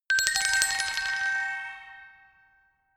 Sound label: glockenspiel mysterious tremolo high short; percussion